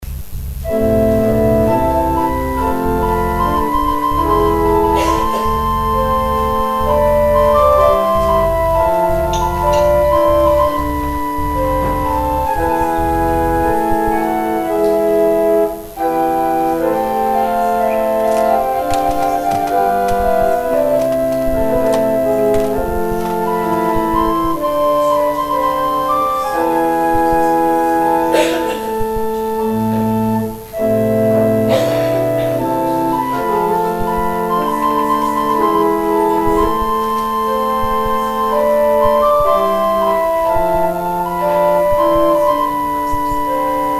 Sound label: organ interlude; during the offering